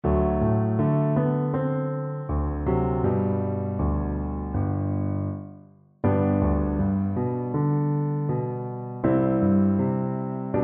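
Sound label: viola karaoke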